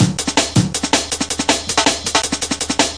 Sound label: 161, drum loops; 161 to 180 bpm